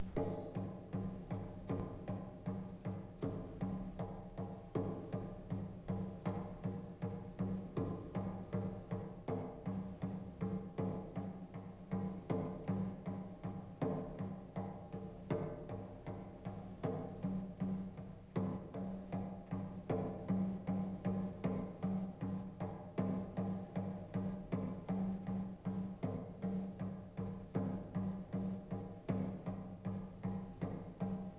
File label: drums